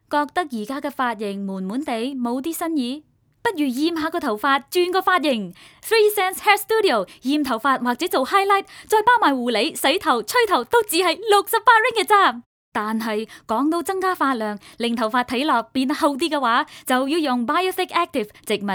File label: Jingle